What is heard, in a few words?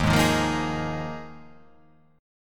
D sharp Suspended 2nd Suspended 4th D sharp